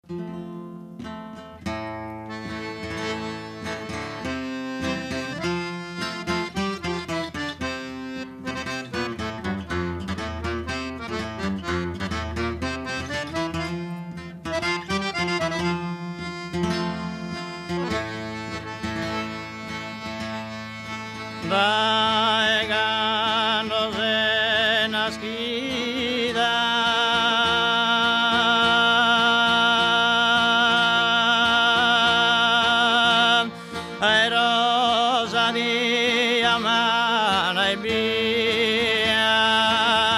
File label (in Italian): canto a chitarra; archivio rai sardegna